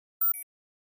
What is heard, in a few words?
Flash Beep Dbl Elect